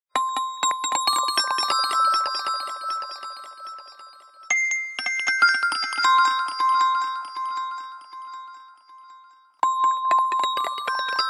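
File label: Ring, ringtone; Samsung; GT-i8700 Omnia 7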